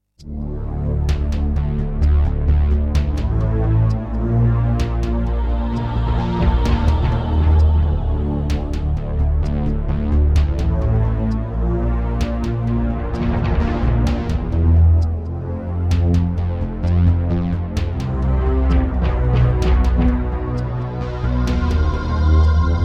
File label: Terratec Wave XTable; Descent II - Briefing